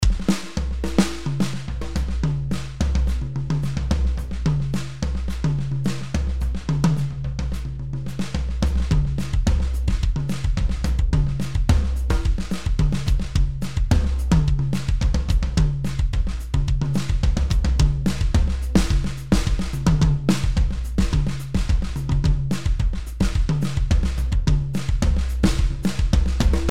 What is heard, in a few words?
Drums Studio Rock; Addictive